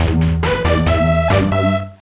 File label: Bathroom Jam